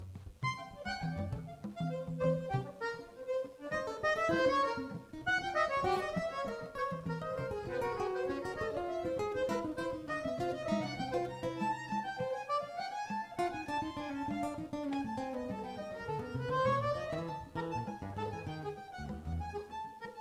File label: instrumental